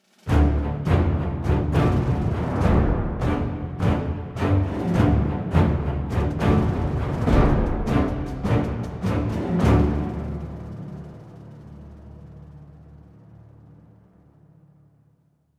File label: Idle Suspense